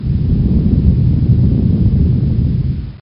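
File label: SFX earth rumble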